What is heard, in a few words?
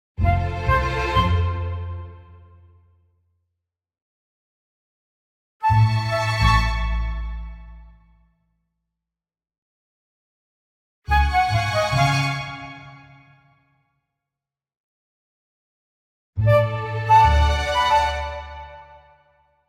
Orchestral Jingle Neutral